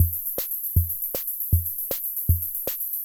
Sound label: Loop, classic machines, rhythm77